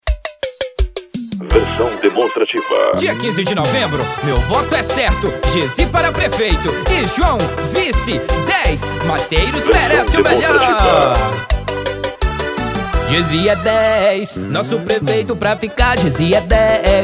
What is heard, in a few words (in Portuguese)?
jingles politicos forró pisadinha; para prefeito e vereador